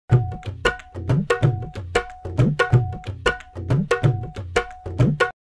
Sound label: dholak